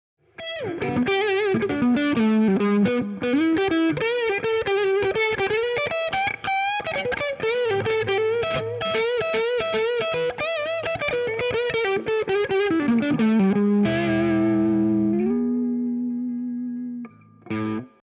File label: H540 Neck Blues